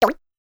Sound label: Game Jump